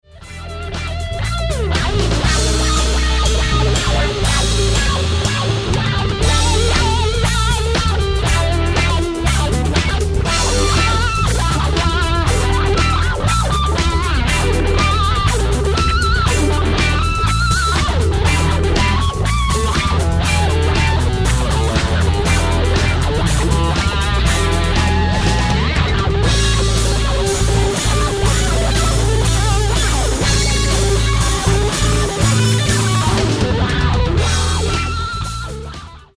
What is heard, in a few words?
funky jam